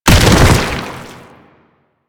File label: Bodyfall Default; Enemies; Warframe; Proto Infested